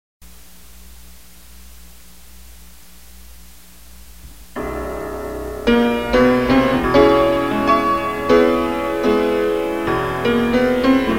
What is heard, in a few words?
PIANO